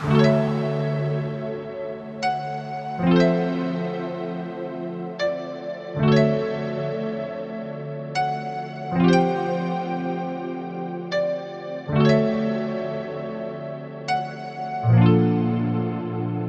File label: Calm; Music